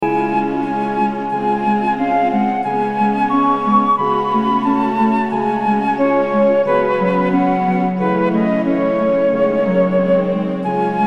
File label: Marche; FFTA